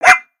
Pet Dog Robotic Happy; creatures pets; Lego

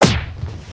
Gorilla Hit; creatures organic

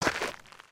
Foot Dirt Sneaker Walk, footsteps

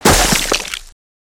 Crates n Boxes, destructibles

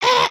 headspin, emotes

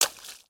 Foot Swamp Water Run, footsteps

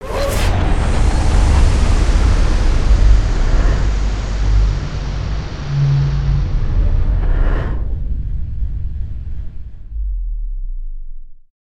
arrival sequence; cinematics; Pet Rock

